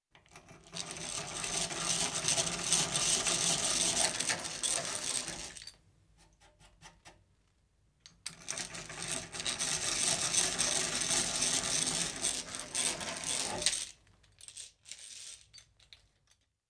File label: Hand-Drill, 2 Holes In Wood; Construction; BBC Sound Effects Library